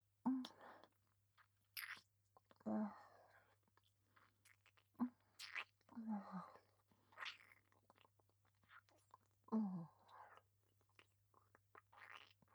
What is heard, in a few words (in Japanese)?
正面 ディープキス